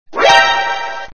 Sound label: surprise sound effect